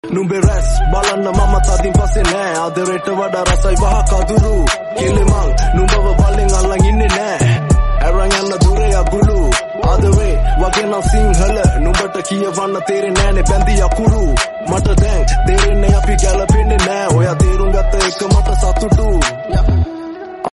remix rap

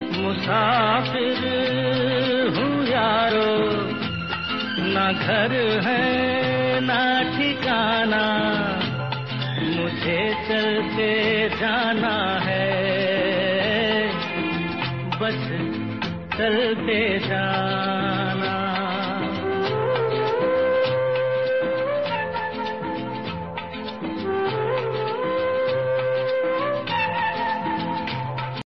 hindi old song

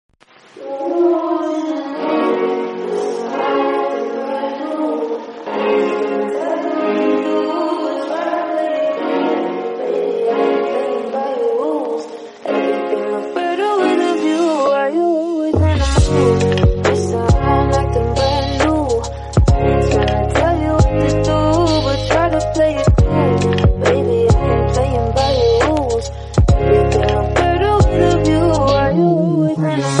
english song

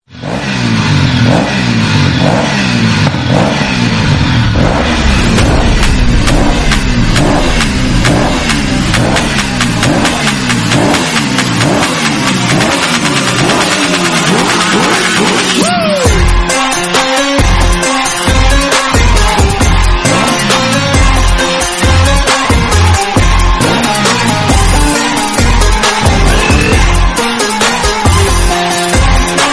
dj remix, tamil

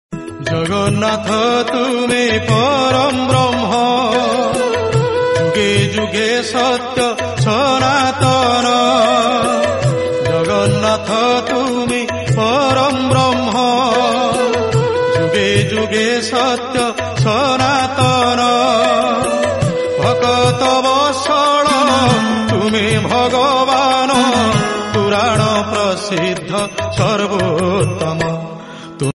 jagannath bhajan odia

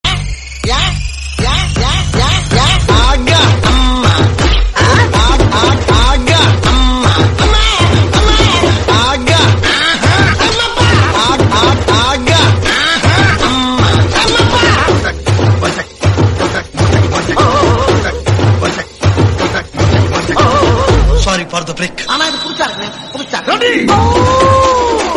mass editing bgm tamil

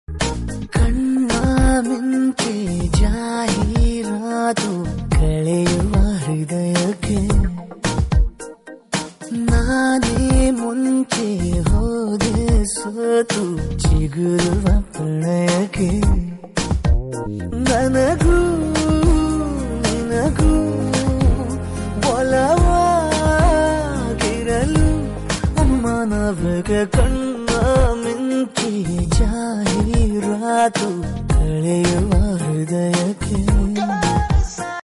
kannada movie songs

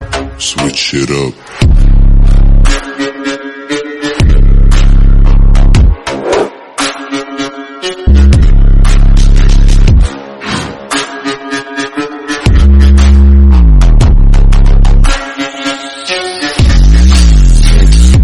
bass boosted

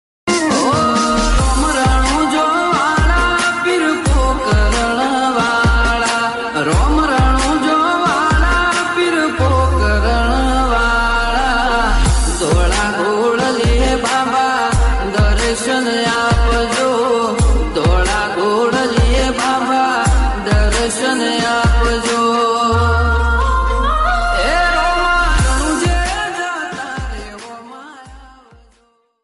bhakti devotional odia

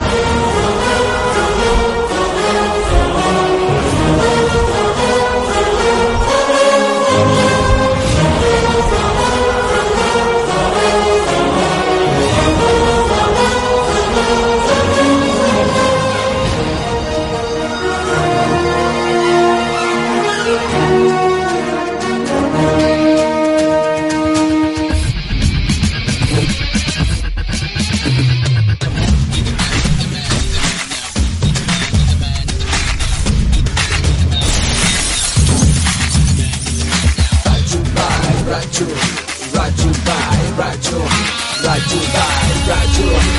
south indian music ringtone bgm

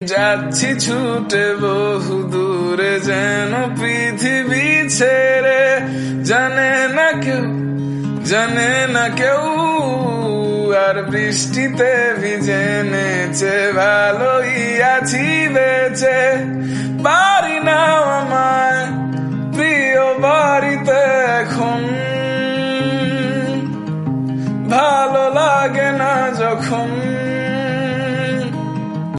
romantic, bengali

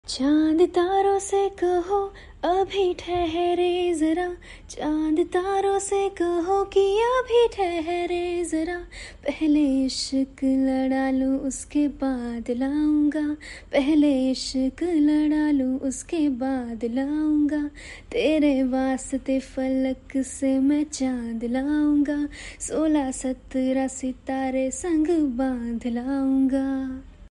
female version hindi

